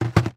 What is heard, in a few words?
christmas calendar cheap down on surface; zapsplat